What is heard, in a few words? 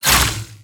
Fight Hits; Forgotten Neons